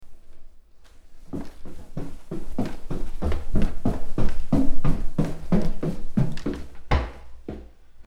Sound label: Stair Footsteps; horror; horror sfx vol 2